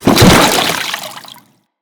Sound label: Fall Water, Foley; Characters; Tenno